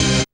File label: dance chord